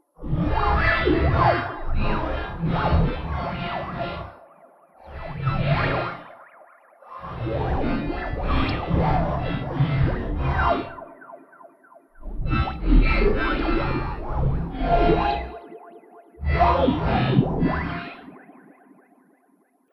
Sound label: Alien Radio Tuning; Alien Sound Effects